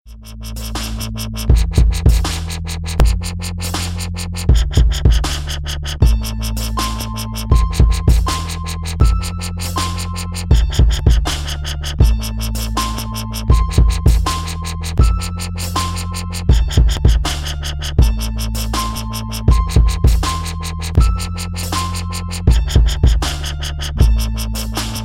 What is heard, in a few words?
Dubstep Alarm